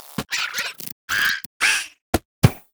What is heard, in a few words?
dragon; lulu dragontrainer